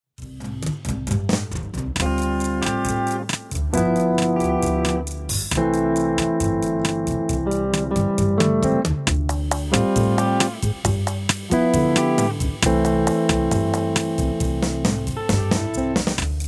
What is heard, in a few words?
bossa